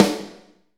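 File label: SNR snare, Medium Rock; Northstar; Drumscapes Roland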